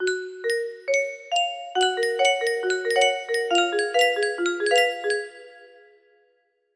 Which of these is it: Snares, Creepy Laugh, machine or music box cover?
music box cover